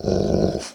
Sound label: Wolf Growl; The Battle for Wesnoth